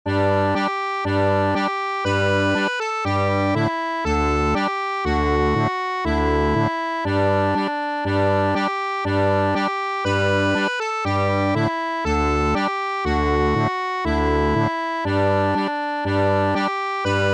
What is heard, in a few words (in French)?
Gavotte; des notables